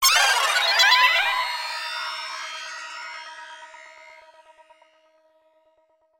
zvuk proigrysha

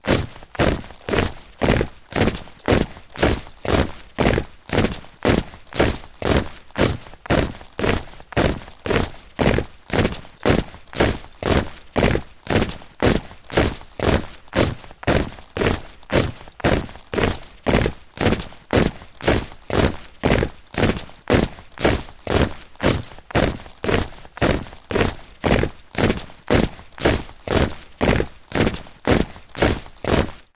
zvuk marsha soldat

